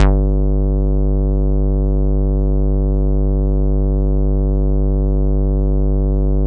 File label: bass, synths